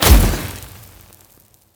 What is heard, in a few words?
light fire, candlelight